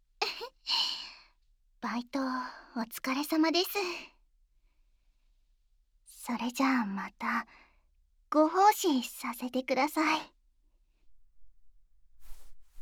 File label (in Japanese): 耳舐め+触手耳攻め; お耳ぐちゅぐちゅ!触手少女の恩返し